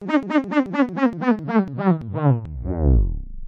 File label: Dramatic Robot